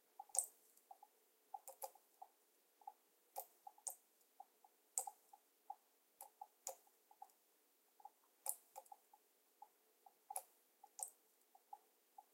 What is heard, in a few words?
Drops Water; Game rip; MiSide